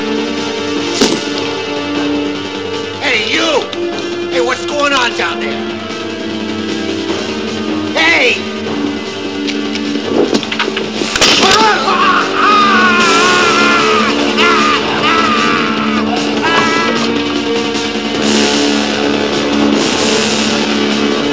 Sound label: ouch